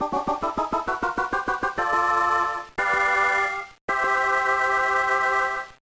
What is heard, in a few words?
Jingle